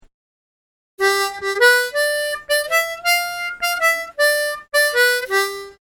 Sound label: Lick